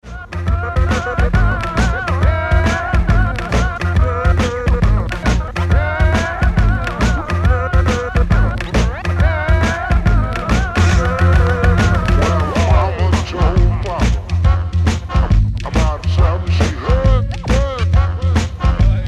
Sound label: 2 bit Blues